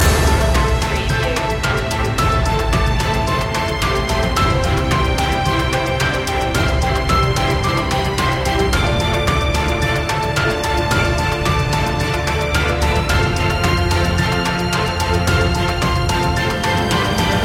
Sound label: victory in the battle loop; media music now